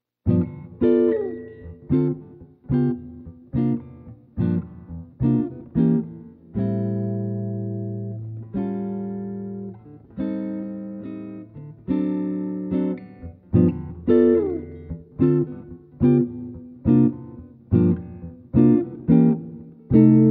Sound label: Chord Melody; jazz tunes